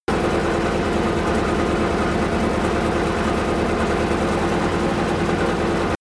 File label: tank medium engine slow idle steady